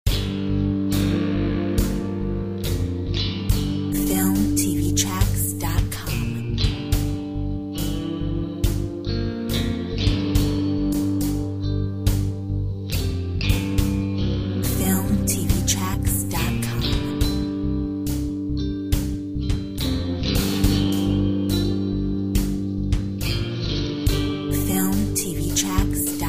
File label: Desert blues